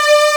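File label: synth strings; cubic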